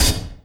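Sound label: Cricket Oneshot, beats; Sonic Foundry (Sony Creative Software); Esoterik Beatz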